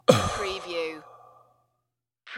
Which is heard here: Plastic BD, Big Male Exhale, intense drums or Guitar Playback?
Big Male Exhale